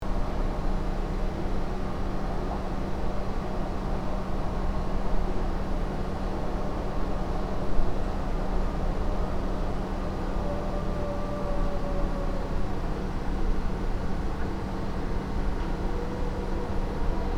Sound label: Bedroom room tone ambience loop